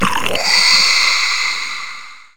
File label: Sound water spert; WHALE